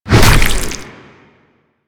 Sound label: Tentacle Swing Hit; Enemies; Abilities